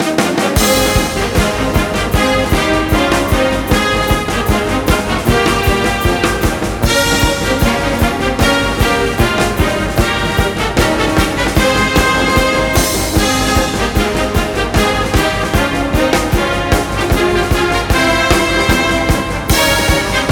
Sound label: Broadcast Outro